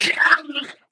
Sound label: ballbot pain hard surface